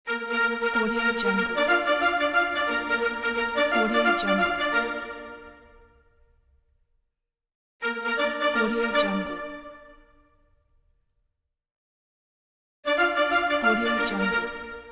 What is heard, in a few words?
fanfare hippodrome